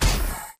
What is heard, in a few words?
Jumpjet Jet Start Chuff; Titanfall 2